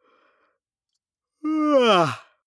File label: male yawn vocal; koerpergeraeusche; menschen; soundpool